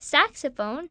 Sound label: saxophone; The Interactive Alphabet